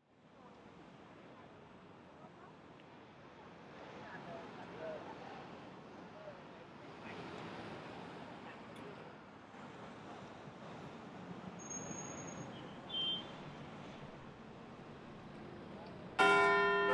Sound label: The chimes of Big Ben